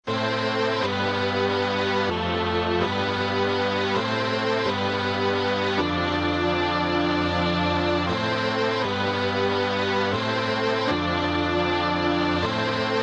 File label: mello